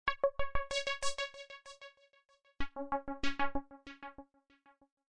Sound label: Flourish